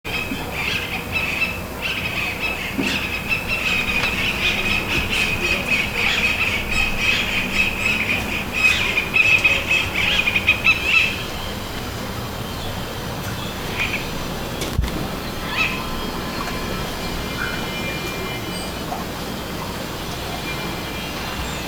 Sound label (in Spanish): Cantos Gritos Sagrada Pareja de Hermanos Halcones; Hogar VM Thoth Moises